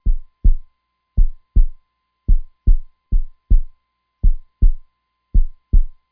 Heart Sound

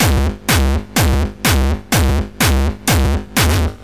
Hardcore Loop

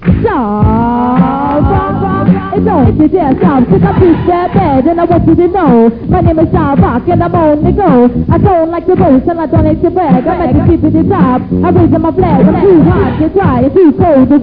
Old School Hip Hop

